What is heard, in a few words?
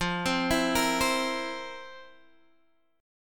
F Major 7th Suspended 4th; arpeggiated